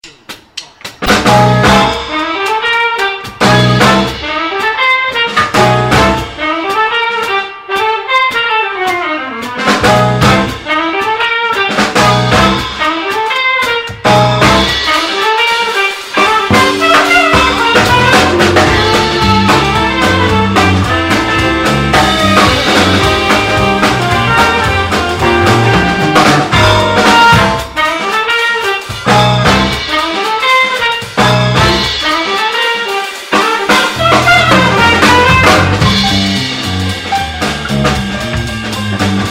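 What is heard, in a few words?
Fender P Bass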